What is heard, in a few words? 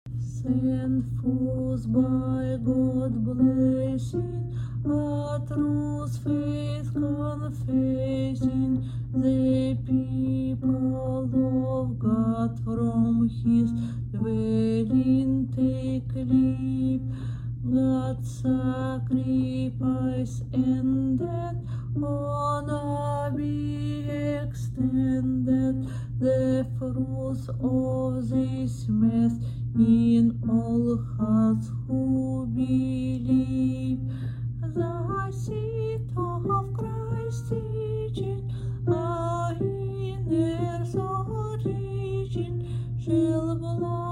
Sent Forth ALTO